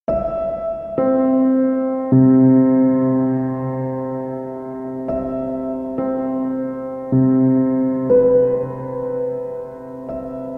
Piano Sounds

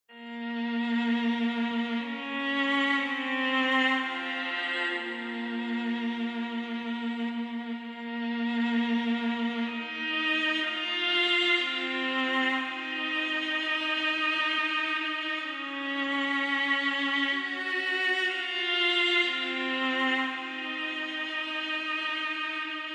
Moonlight Improvisation

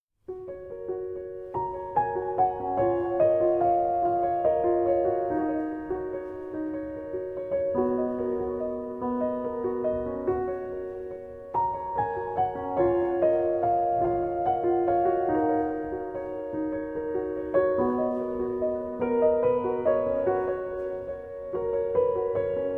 Solo Piano Version

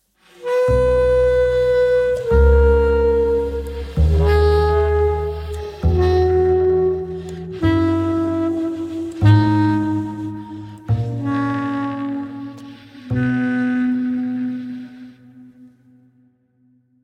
B Major Prelude

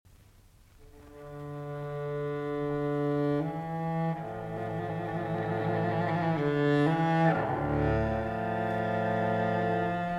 Cello Suite No. 2 in D Minor, Sarabande; Bach, JS; BWV 1008 IV.

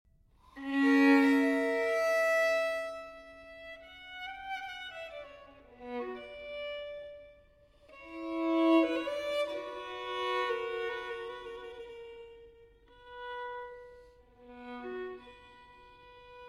Solo Violin; Fantasia No. 3; in F Minor, TWV 4016; III. Grave – Vivace; Telemann

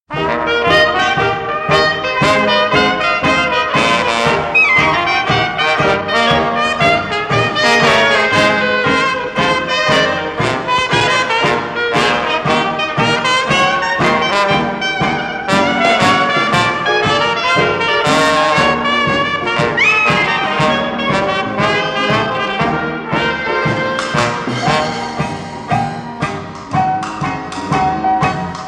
Blues

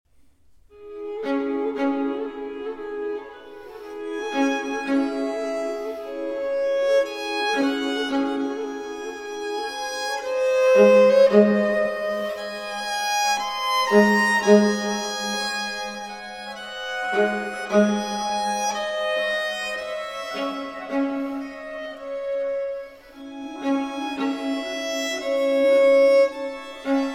Violin Concerto in E Major, Spring, Largo

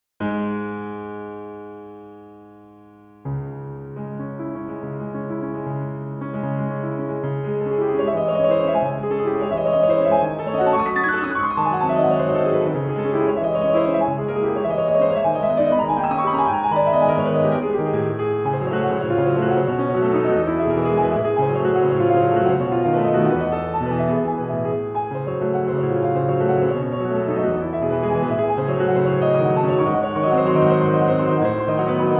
Fantasie Impromptu, Op. 66, C-Sharp Minor

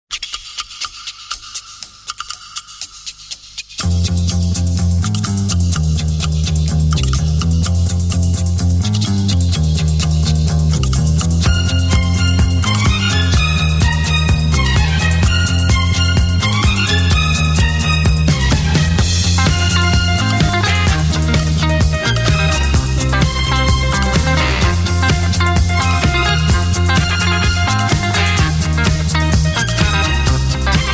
Disco

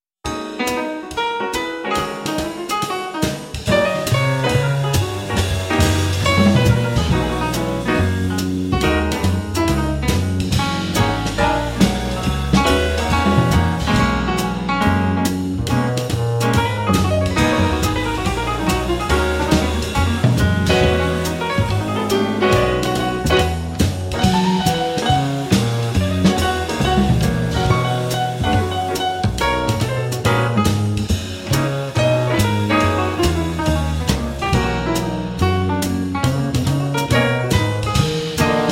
In B Major, Jazz Ensemble

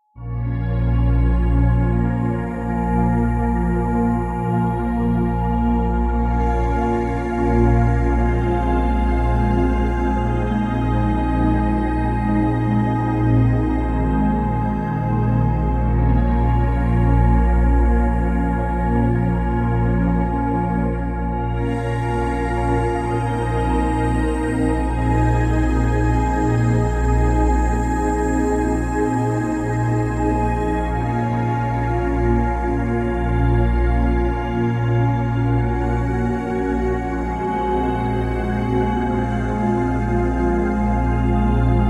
Root Chakra 128 Hz

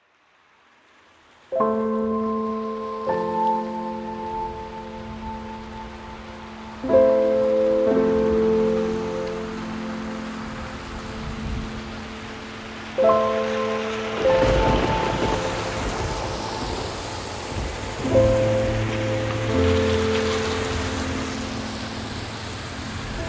Piano and Rain

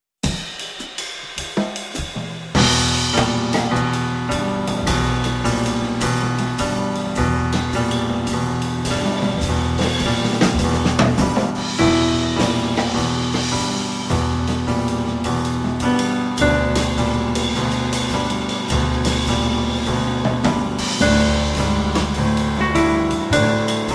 In A Minor, Jazz Ensemble

